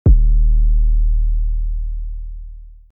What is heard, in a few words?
808 kick